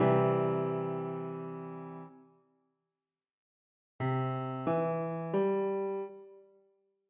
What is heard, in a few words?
arpeggio